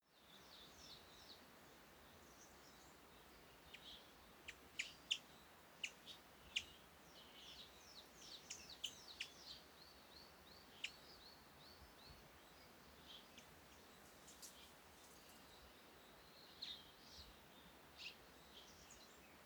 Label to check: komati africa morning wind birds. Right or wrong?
Right